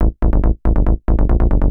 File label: TECHNO BASS 140 A